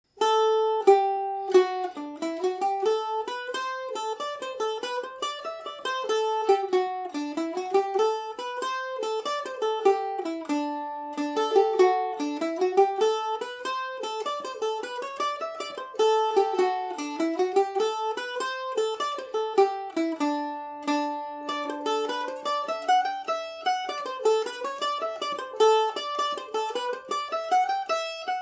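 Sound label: achreidh jig D mixolydian, irish mandolin; Falder mandolin; Listen to me playing The Achreidh Jig on my Falder mandolin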